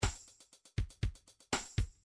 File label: country, midi